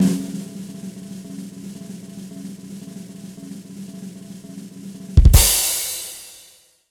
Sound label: drum roll